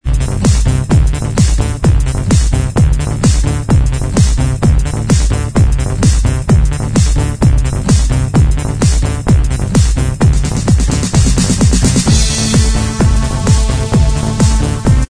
extended dance remix

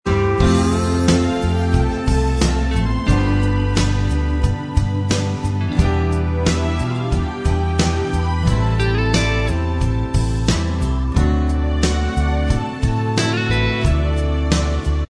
Inst

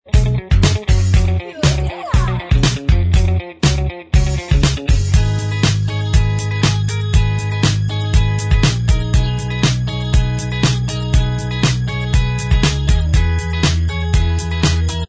Inst Track

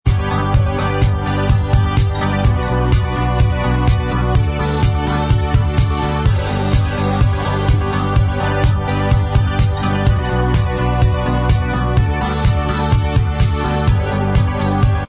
Inst Track

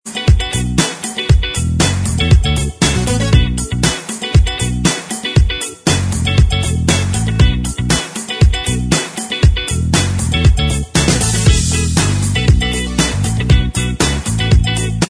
Inst

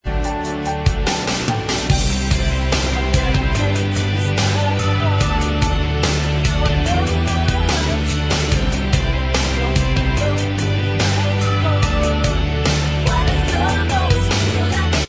Bvox

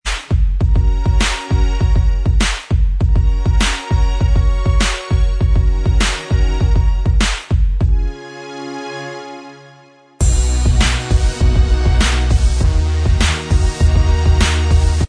Inst

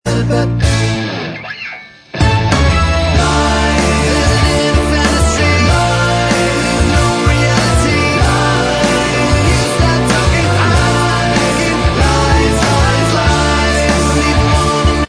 Bvox Track

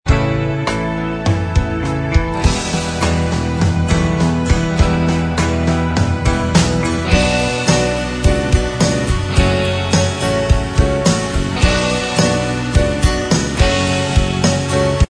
Inst Track

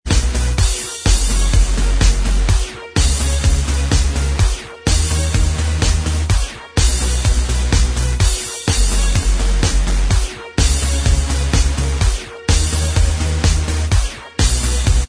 Inst

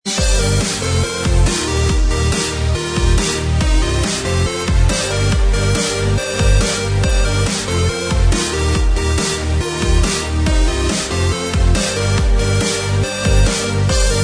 Inst

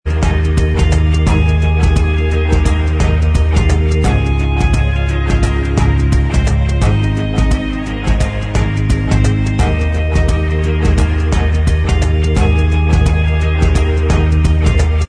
Inst

